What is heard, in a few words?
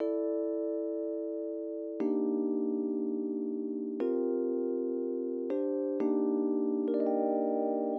120 rhodes, Keys, Nu Jazz House; PowerFX